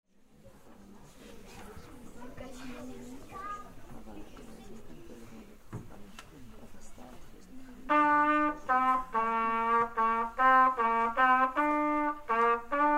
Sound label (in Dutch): trompet